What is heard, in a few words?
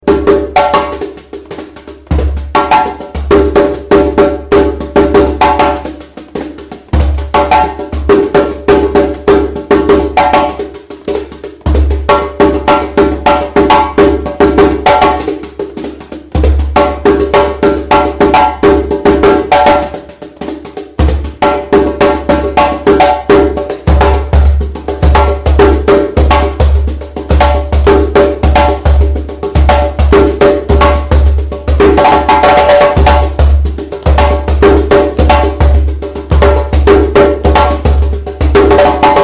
solo